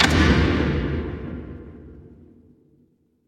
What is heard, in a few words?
magic scifi laser